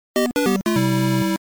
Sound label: Miss; Super Mario Bros. 1-3 Anthology